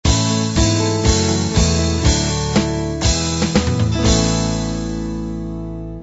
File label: uk pop end